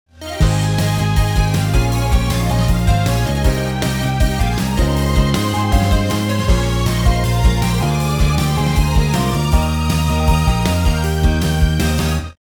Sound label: bass